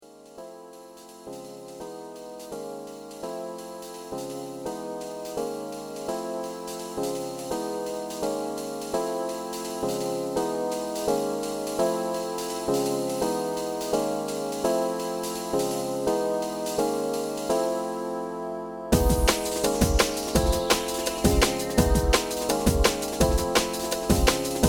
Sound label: Sax Lick; Sax Lick 2